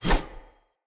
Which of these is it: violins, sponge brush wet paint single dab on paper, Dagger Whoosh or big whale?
Dagger Whoosh